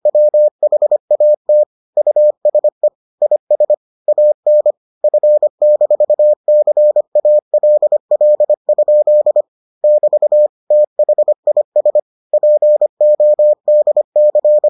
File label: morse